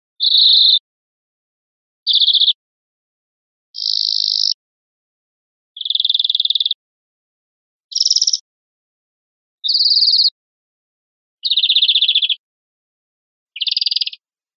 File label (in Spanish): chorros cortos encarrizados, verderon